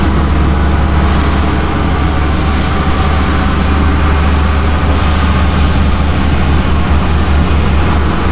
Vehicle Engines; Red Orchestra 41-45

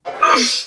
pain grunt, Human; Titanfall 2; Pilots

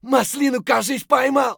hurt light; Escape from Tarkov; scav2

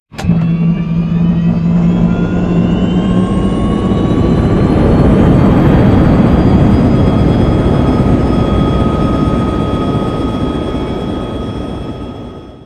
engine tvd start cockpit; War Thunder

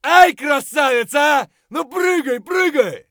fight; Escape from Tarkov

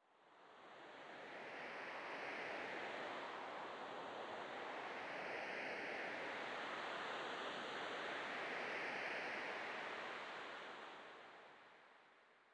sting gentle wind; Rust

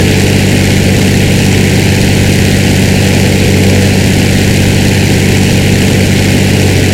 stuka rear, engines; War Thunder

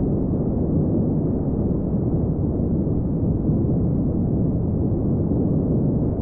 Aircrafts Plane Wind In L; DCS

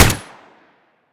mr133 fire silenced close; Escape from Tarkov

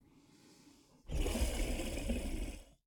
sleeping; Rust

